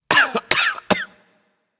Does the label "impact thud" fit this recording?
No